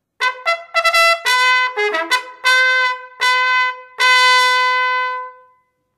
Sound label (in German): Sammeln, Kavallerie